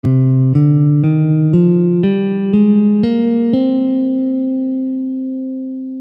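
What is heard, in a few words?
Aeolian C, Gammes & Modes · Guitar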